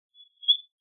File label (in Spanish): ave; choir of species